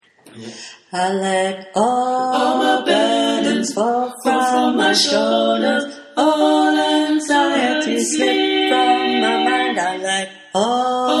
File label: Power of strength Backing